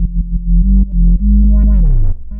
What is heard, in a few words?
Roland B Line, Roland S100